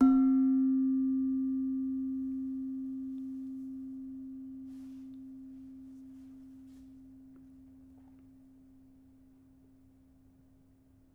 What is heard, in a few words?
Demung soft, gamelan